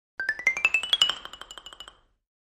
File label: Xylophone Accent Shr